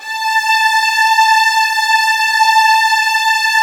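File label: violin; Virtuoso Strings; A3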